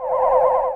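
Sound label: Ambience Sci-fi Energy Loop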